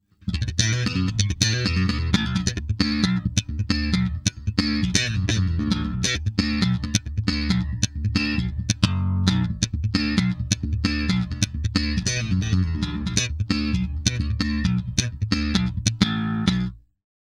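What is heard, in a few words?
Chorus slap compression, guitar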